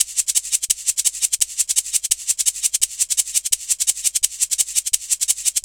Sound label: shake n vac, Drum Bass Drumloops 170bpm; Zero-G; Total Drum Bass